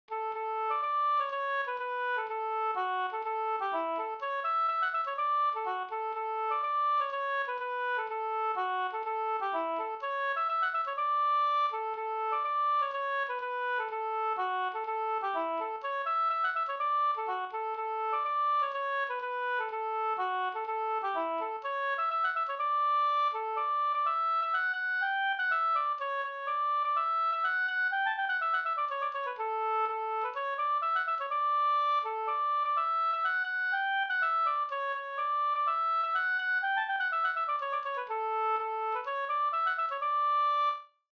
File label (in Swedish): hamburska; Sodertalje